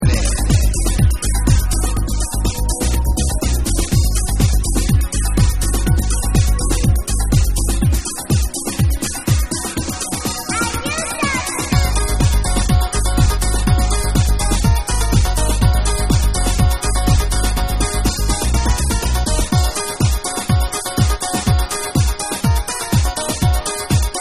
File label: Instrumental Remix